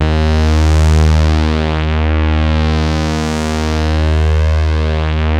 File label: PULZE SWEEP, BASSES; Masterbits; Vintage Voltage